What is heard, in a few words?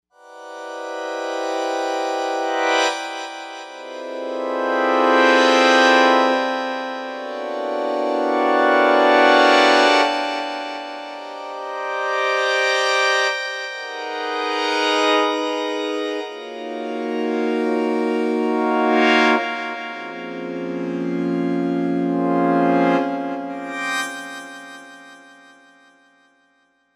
Novation UltraNova; Factory Patches